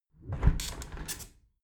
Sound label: window close regular sequence